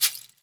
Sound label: SND RAIN SHKR; Spectrasonics; Liquid Grooves 1 & 2